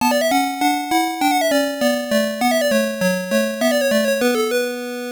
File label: Round Start; Choplifter; sg 1000